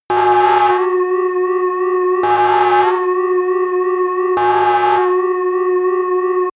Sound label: starship red alert